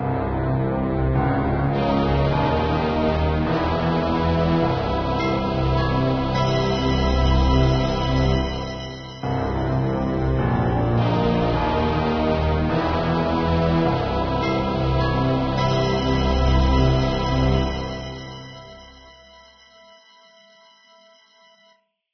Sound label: bad news; race-into-space